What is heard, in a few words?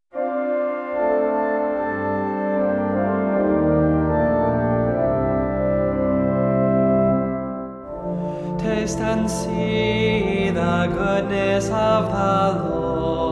Gregorian chant english